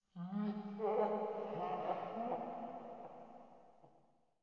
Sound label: laugh distant; fredbear